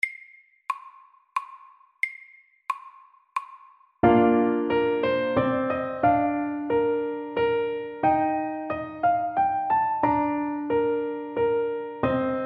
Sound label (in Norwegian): Menuett i G-dur sakte